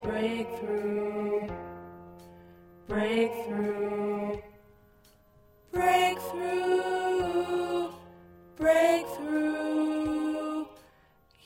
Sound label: Alto